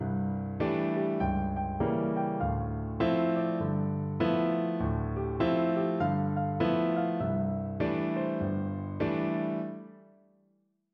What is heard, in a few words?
Stride Piano